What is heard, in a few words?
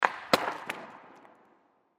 Hockey Slap Shot, Hockey Slap Shot Puck; Hockey Slap Shot Puck Into Goal; SoundEffects-Blastwave; Sports